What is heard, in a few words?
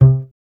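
STR CBS, Solo Instruments, double bass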